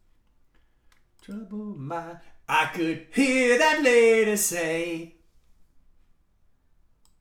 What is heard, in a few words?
vocals